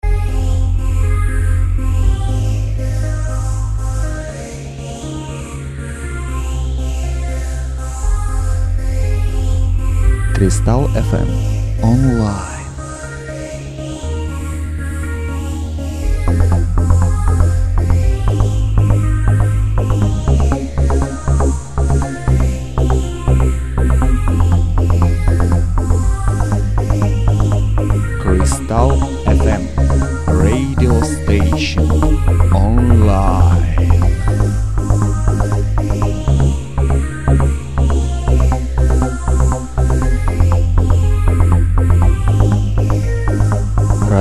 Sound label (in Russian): РАДИО ДЖИНГЛ